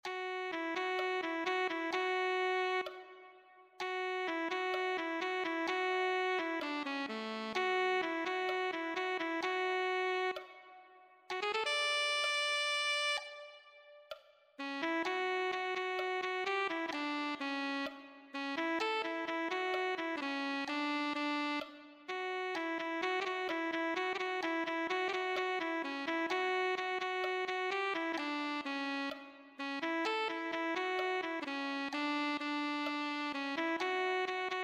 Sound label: alto saxophone